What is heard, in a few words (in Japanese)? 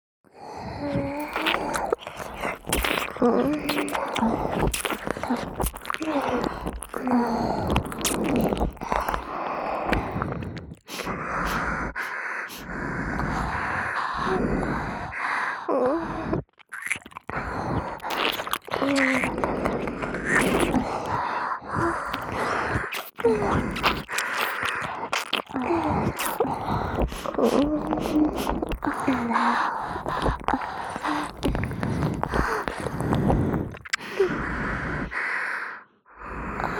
左右同時耳舐めループ